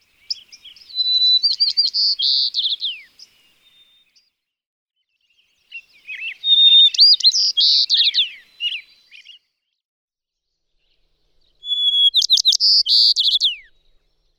White-crowned Sparrow

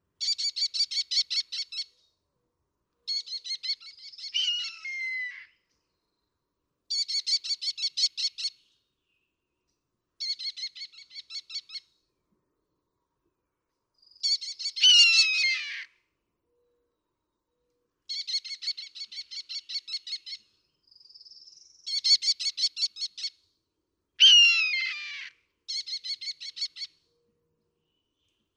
Red-tailed Hawk

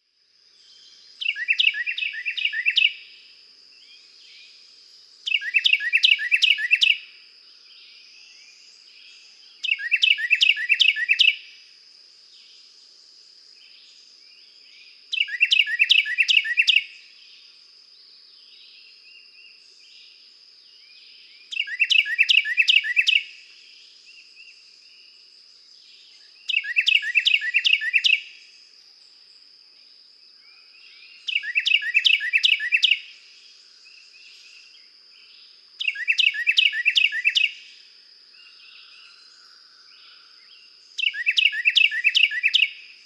Carolina Wren

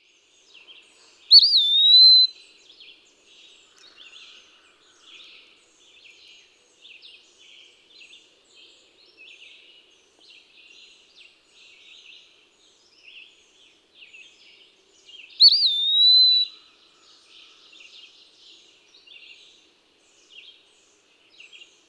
Eastern Wood-pewee

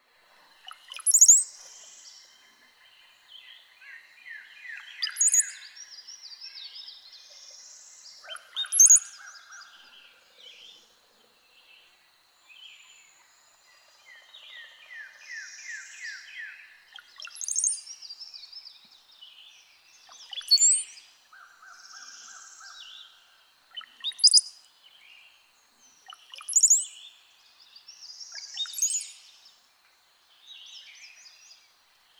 Brown-headed Cowbird

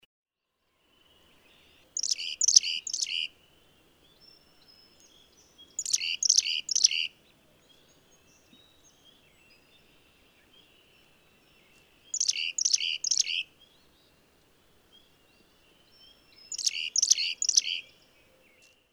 Black-capped Chickadee

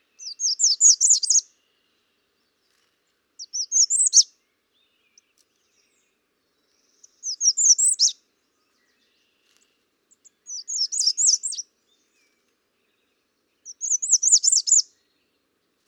American Redstart

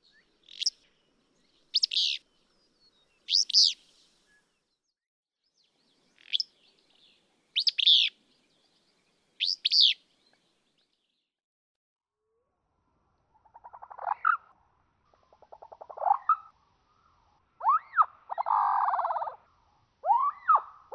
Willow Flycatcher; the two FIZZ-bews, Missouri first, Oregon second; the same sequence as in (2) at quarter speed.

